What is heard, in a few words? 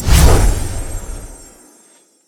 staff healing